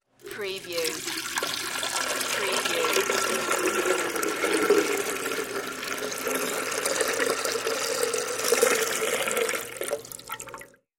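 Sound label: Pour Liquid Container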